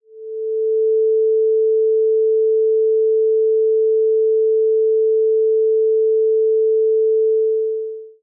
Intermodulation Sine 440Hz Noise 24k; Aliasing und Oversampling in der Musikproduktion

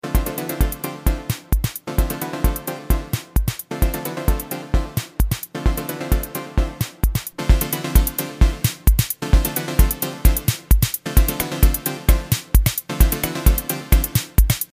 Soundmaxx II Eurorack Stereo

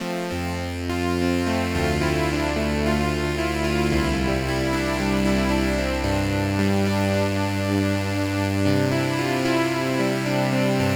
80ies Poly; Super Gemini

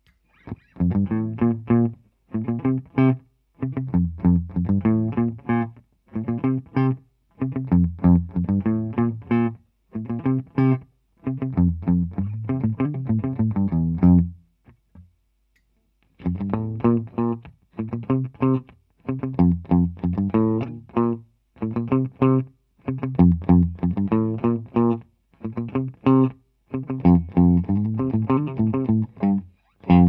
Clean Single Note Line

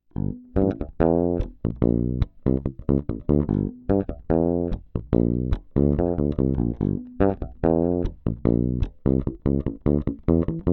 Fretless no preamp Bridge Pickup

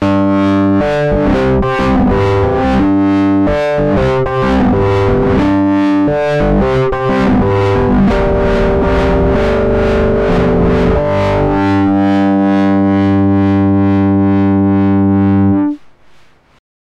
Elektron Analog Heat, High Gain Filter LFO Rhodes

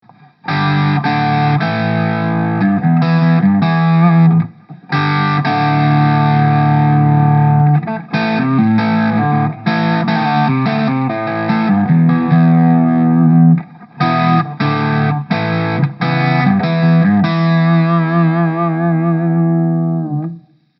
VOX MV50 Boutique full gain steg

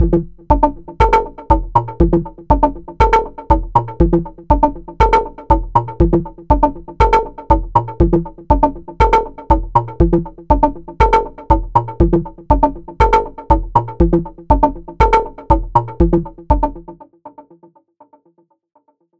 Dreadbox Drips V2 Delay bd

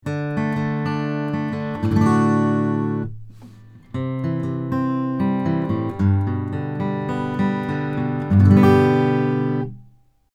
AcGit Dry

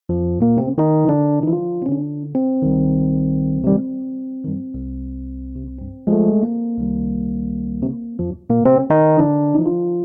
Clavia Nord Grand EP8

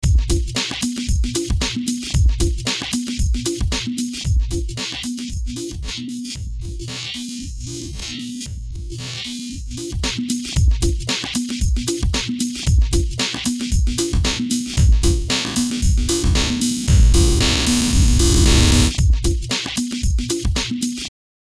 Drumloop blur; IL Harmor

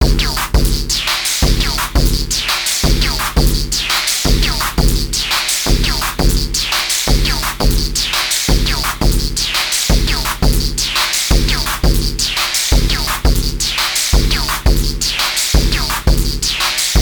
Oberheim OB-E Drum Mode; G-Force Software

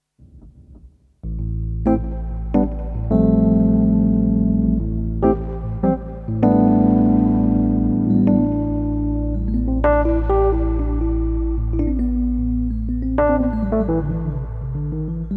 rhodes MK-1; ursa keysuite

